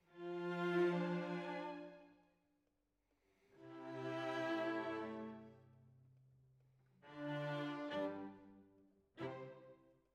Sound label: Violas, jazz for piano